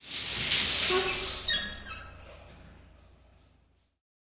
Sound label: Prisoner Shower Off; Prison Architect